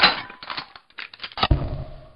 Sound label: RELOAD